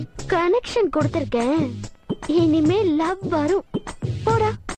Love Msg

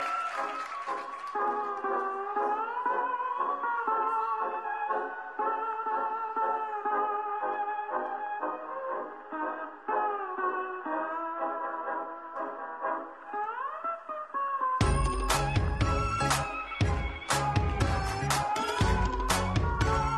Super Tango

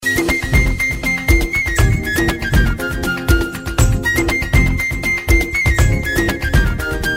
Desi Flute

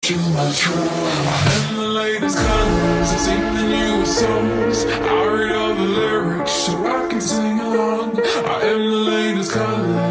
GRRRLS male

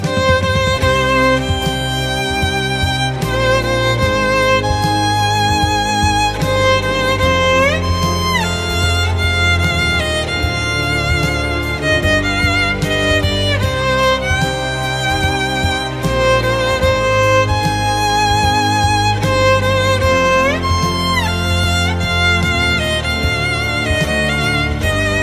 Violin Cover

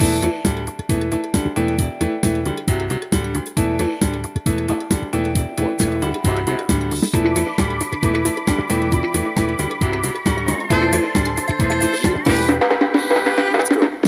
dancing; Persona 4

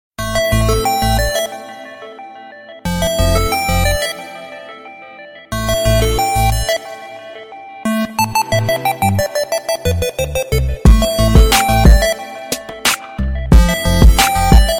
Music Long Night

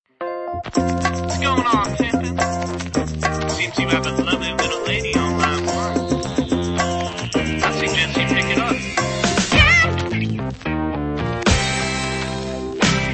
Glitter Tone

